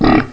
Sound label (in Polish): chrum